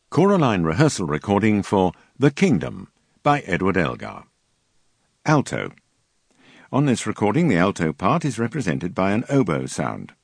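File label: Alt, alto; Elgar Kingdom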